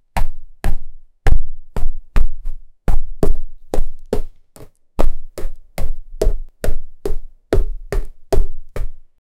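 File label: giant dinosaur trampling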